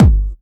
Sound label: Kick Burst